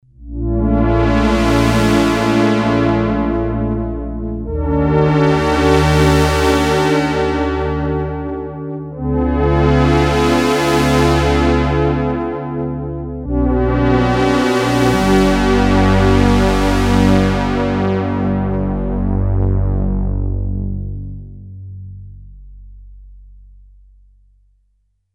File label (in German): Schwebungen; P600 gligli